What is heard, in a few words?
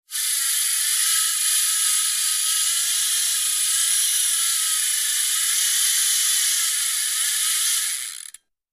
Fishing; Reel Plays Out Line Quickly, Casting.; Fishing Pole